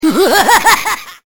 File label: laugh